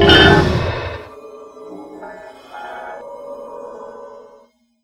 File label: scare jump out